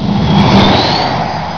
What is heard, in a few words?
GO FAST, TRANSPOR